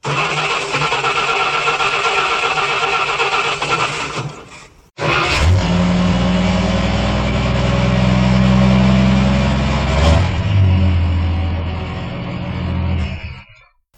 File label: Starter Cranks Starts; Dvaliant